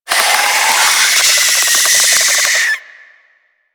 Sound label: Infested Face Hugger Charge; Warframe